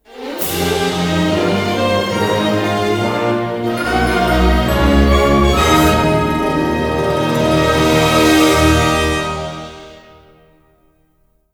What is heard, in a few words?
JINGLE GET HEARTPIECE